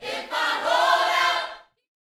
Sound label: Vocal Gospel PHRASE; Spectrasonics; Vocal Planet Vol.1 Gospel